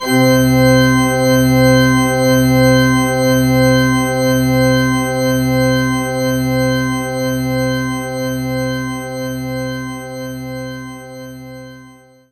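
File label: Pipe Organ A sharp 1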